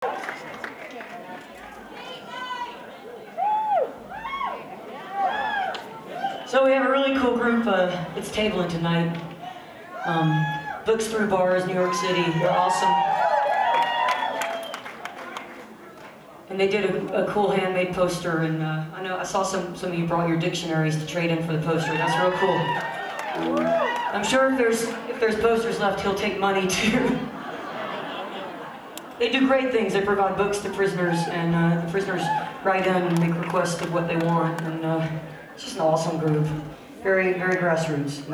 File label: happy birthday, audience